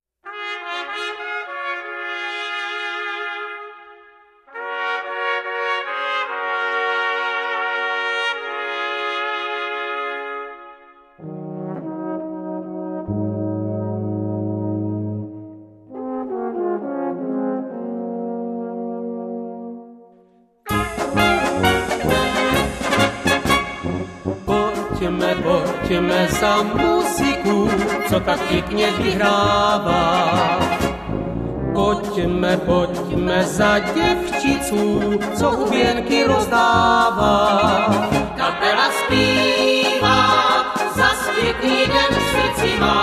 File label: polka; Vlcnovjanka